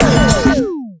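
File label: Bello Oneshot, beats; Sonic Foundry (Sony Creative Software); Esoterik Beatz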